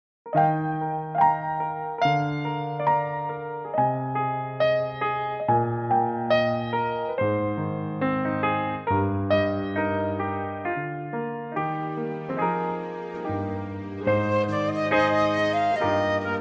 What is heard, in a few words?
Eb, flute